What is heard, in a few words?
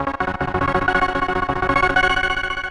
synth